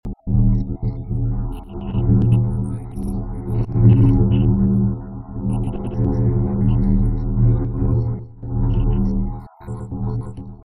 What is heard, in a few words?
wind wand stand